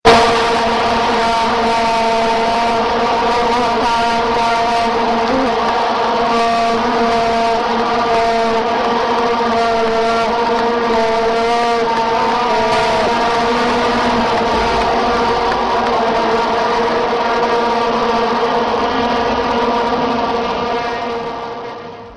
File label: Alarm Crazy Loud